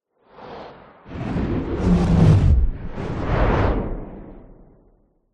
fantasy magic spell whoosh ball of energy pass by fast